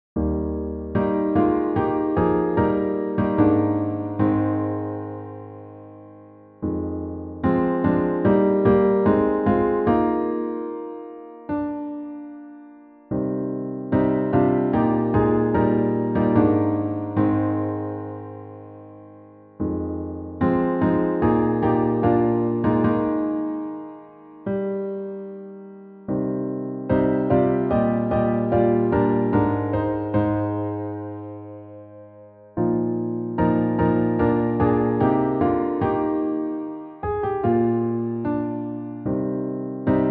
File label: piano